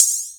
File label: open hihat